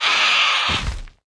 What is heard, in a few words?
archionis dead, monster